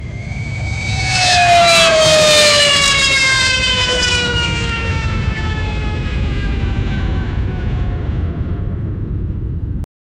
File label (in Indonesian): mesin pesawat mati, airplane